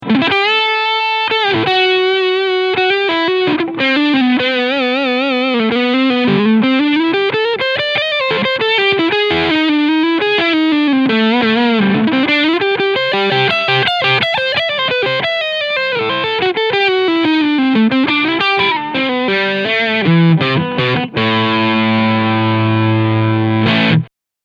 PRS SE McCarty 594 Vintage SB Leadsound beide Humbucker

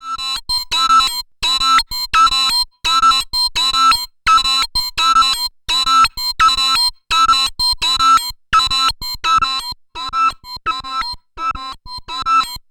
Korg volca modular Ext Seq Maultrommel LPG

